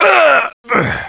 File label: death, player; xash3d fwgs; dmc